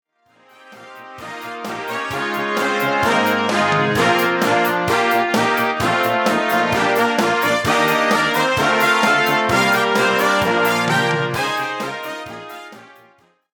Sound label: brass